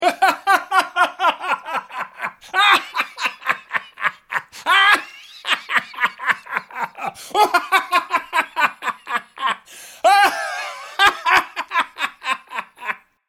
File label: Hysterical Laugh, Male hysterical laughter.; Laughter (Sound Effects:Voice)